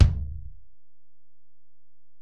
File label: Ac BD, Alesis DM-PRO; 300 Drum Machines